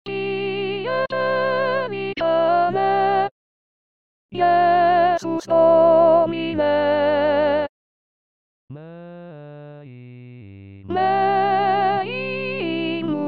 sopran; pie pelicane

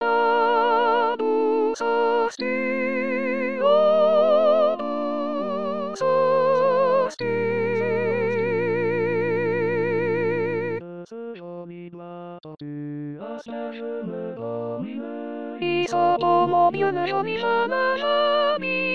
soprano

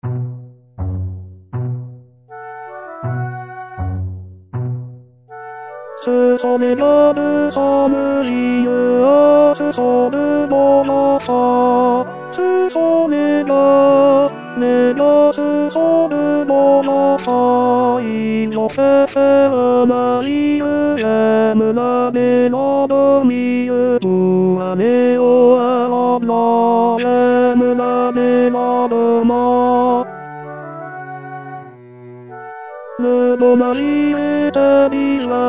tenor; 2026 saint jean

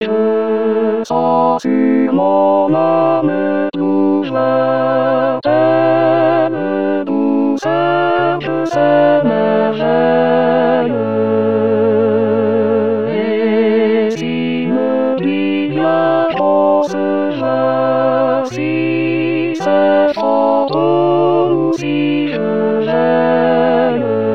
tenor; rimailleurs

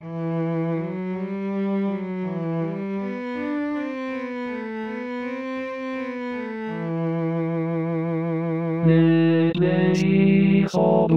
tutti; plaisirs

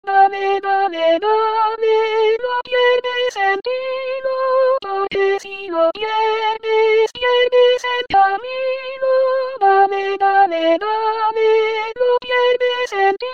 tutti

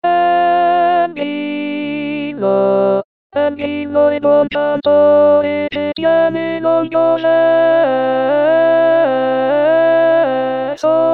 alto

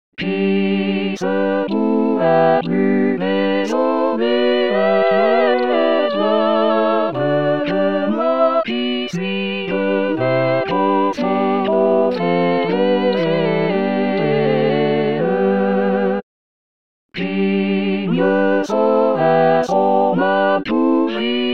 tutti; rimailleurs

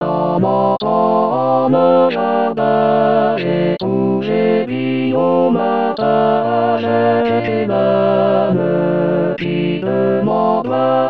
tenor; rimailleurs

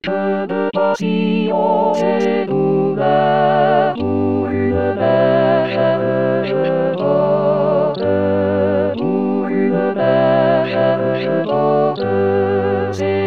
tutti; rimailleurs

